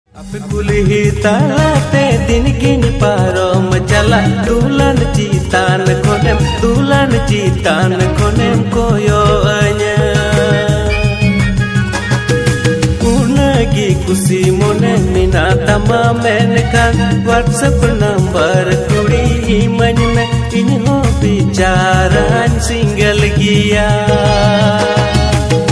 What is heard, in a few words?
Santali Ringtone